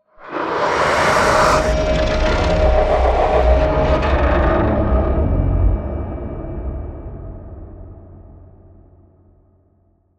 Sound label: Stinger Ice Hole Break Prologue; Unto The End; Xbox Series X|S